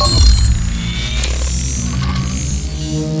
gauss precision start mixed; doom gauss

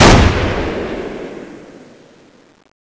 scar fire; cso; scar oz

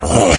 pain; doom3; morgue

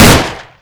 colt shoot; m1911; weapons

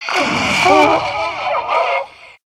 idle, zomb female; nmr

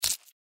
mantis foot; npc

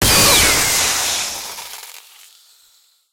gatling heat cooldown, apc weapons; gow

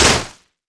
glaive hit wall

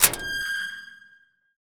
metal door open

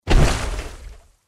water walk, power armor; clothing system

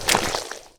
foot

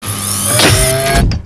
delorean hover off

